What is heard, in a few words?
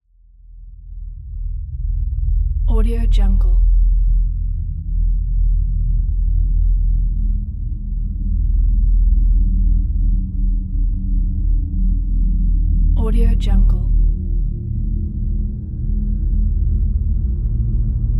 horror ambience; teasound